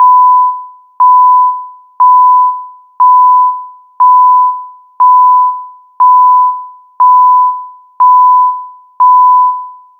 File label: short sharp beep, fitness